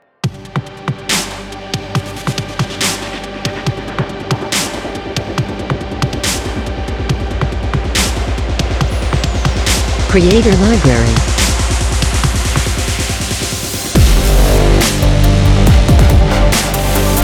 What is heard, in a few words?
Futuristic Hightech Dubstep; Corporate